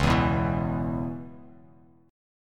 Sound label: C sharp Minor 7th, Bass Standard; strummed; chord; 9,7,9,9; JGuitar